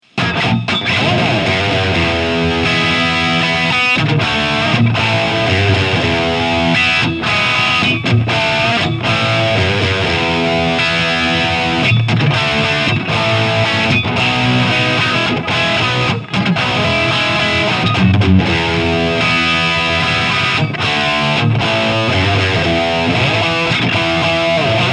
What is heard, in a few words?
Red Channel; Friedman BE